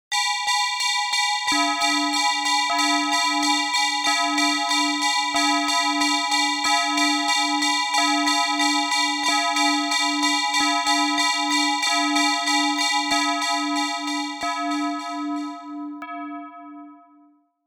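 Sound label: bells; kolokola; maslenitsa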